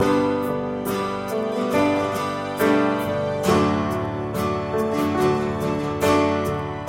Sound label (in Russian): zatsiklennye melodii dlia gitary loop